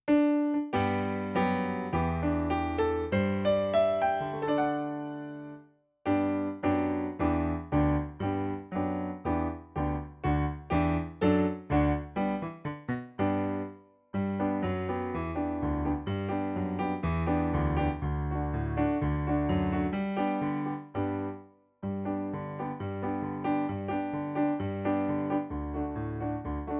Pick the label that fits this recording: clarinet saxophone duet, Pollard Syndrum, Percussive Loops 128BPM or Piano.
Piano